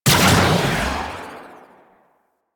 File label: Shot, Weapons; Prisma Angstrum